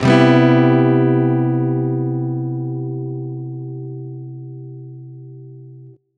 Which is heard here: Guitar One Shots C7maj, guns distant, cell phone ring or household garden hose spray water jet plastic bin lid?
Guitar One Shots C7maj